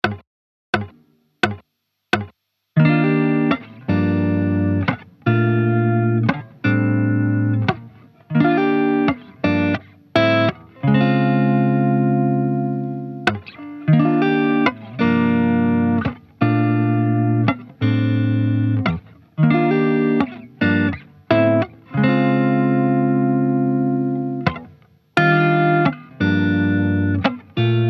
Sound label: Neo Soul Guitar 86bpm